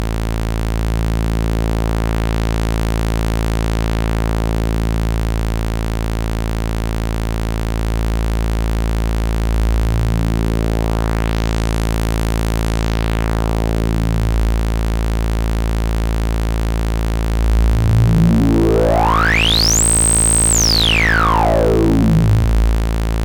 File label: ks20 bandpass, filter; diy